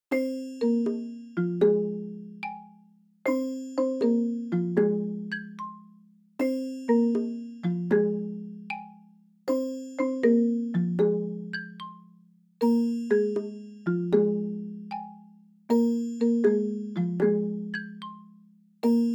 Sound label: Slow